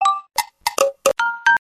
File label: SMS Ringtone; Honor X9B